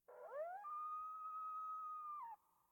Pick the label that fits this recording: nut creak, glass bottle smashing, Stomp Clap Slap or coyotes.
coyotes